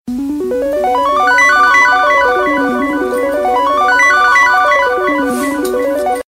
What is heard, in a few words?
Flowing